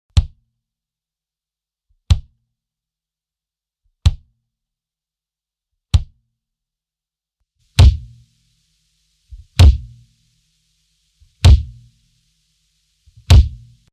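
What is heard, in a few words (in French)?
kick, grosse-caisse; batterie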